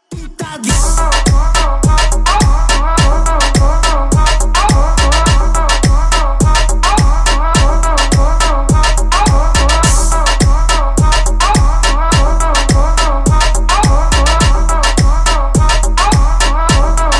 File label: trap remix